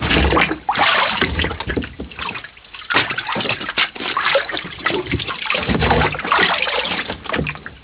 bouee clap; BaseAuckland2

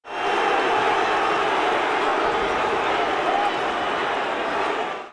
little jeer

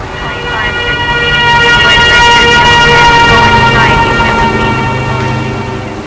atmospheric; running-man

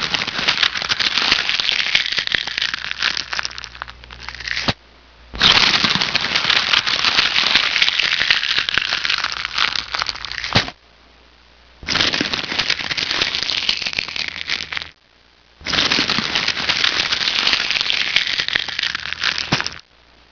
crumple; Key Multimedia Greetings; SoftKey 1997

